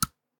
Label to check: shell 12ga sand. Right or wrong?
Right